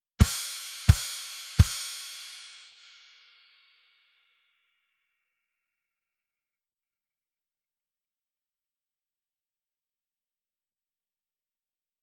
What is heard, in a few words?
Drums